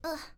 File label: Child Sigh